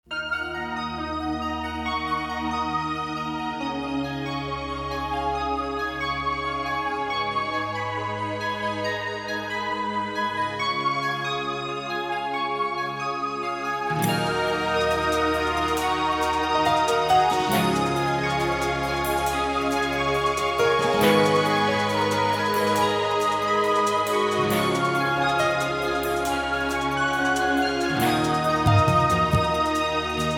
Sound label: instrumental; persian